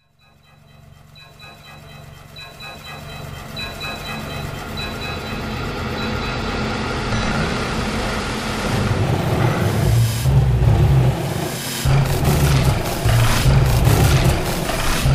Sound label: titanium rhythms